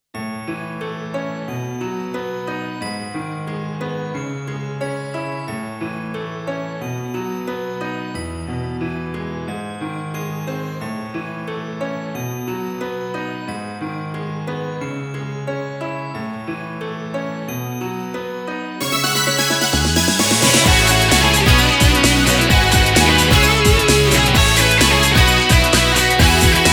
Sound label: refrain moon instrumental; katakoinotuki complete original sound track